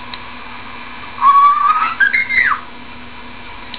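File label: uguisu, Japanese bush warbler; ウグイスの 鳴き真似; 定番のホーホケキョ。 抑揚がちょっと派手なのは 飼い主がそのように教えたせい。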